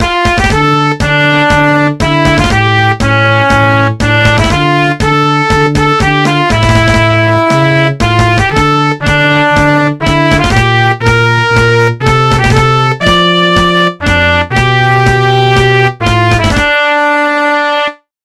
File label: Walk